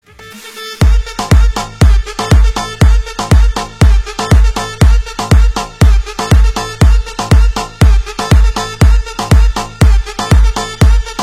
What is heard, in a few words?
arabic remix